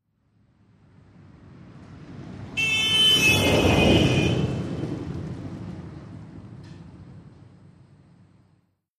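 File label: Montero Doppler Horn By, 1998 mitsubishi montero ext.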